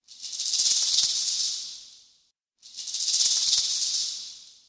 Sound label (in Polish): Marakasy